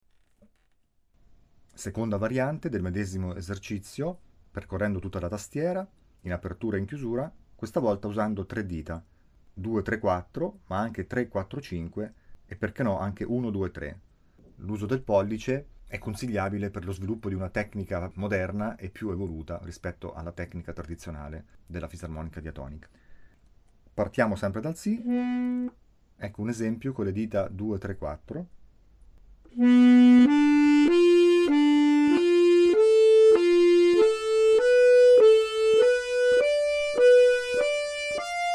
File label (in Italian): fisarmonica, fisa bitonica